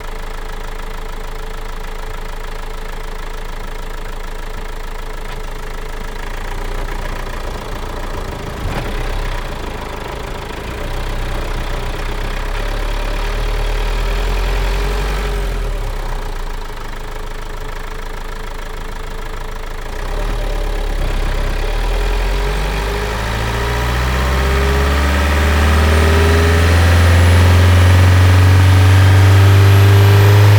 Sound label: renault master 2.3 dci engine diesel rpm ramps; Soundholder